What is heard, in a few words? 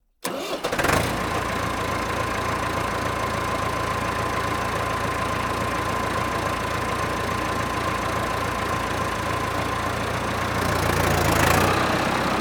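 Massey Exterior Startup Drive Away, Massey-Ferguson 3060E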